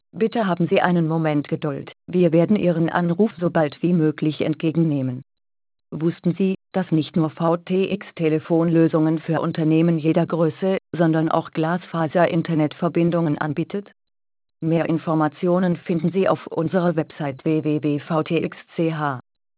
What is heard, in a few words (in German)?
Wartemusik; telefonie